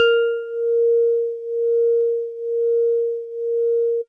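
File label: House Organ DX100 A#0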